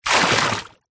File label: nature water pour from plastic bucket into deep water; zapsplat